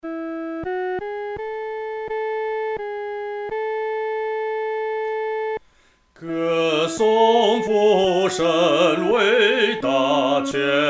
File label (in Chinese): 独唱 第一声